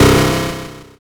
zap

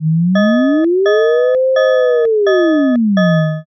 firetruck

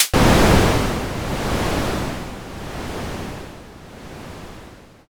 thunder

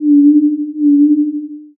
owl

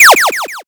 laser

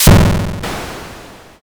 thunder magic

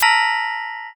sting

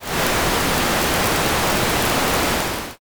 fire

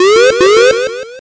warning

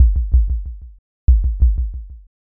heartbeat

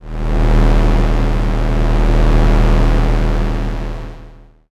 space engine

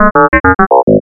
alien signal

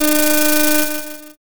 slot spin

